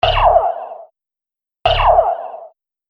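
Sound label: Sci-fi Game 2 Laser Shots; Laser Gun Sound Effects; Andrew Fleming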